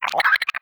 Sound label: idle chirp, headcrab, creatures; headcrab classic; vox